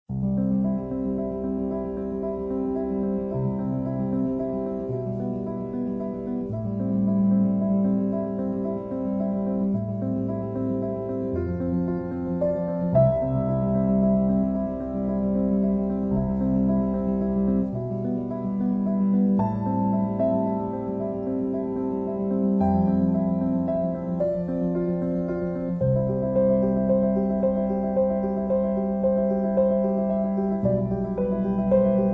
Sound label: Indubitably Mellow loop